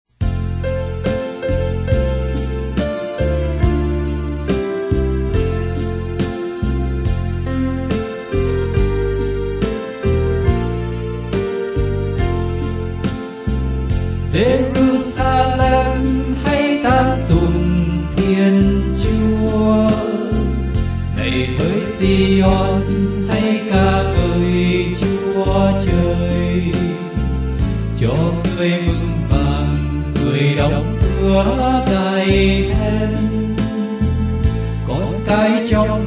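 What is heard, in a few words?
soprano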